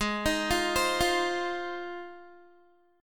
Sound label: Ab 6th, Guitar; 16,18,15,17,17,x; Ab6 arpeggiated